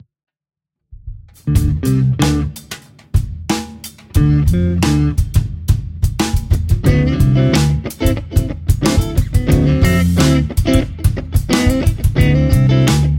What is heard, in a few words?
Funk Groove; Rick's; DDB